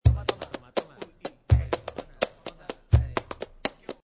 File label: BULERIAS 125